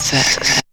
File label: SEX; Zero G; Man Machine